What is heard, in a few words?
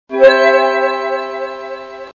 Low Mana; WoW Addons; WotLK Classic; MikScrollingBattleText